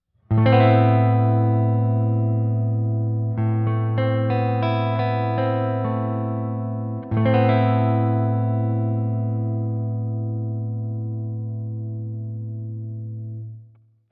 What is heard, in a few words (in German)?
a moll add9